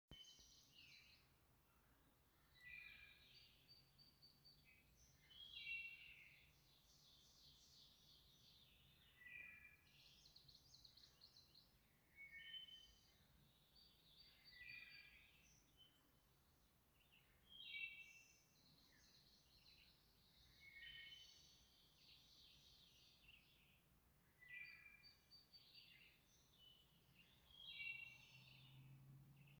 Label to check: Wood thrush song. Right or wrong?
Right